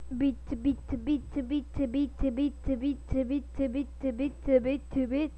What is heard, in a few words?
beat